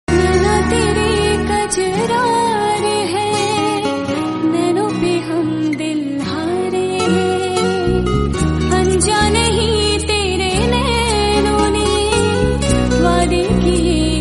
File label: female